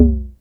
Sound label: SYNCUSSION